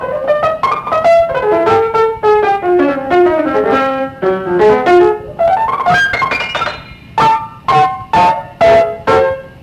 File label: licking tones